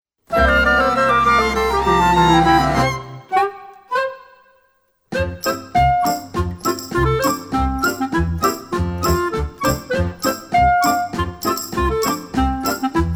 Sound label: polka